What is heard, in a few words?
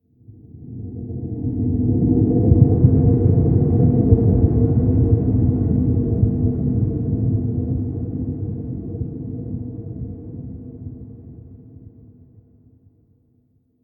Rnd Abstract Amb